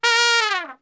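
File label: A#2 TRP SHF, Trumpet; Best Service; Brass Super Section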